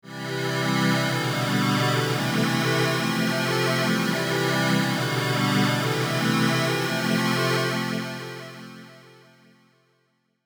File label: Synth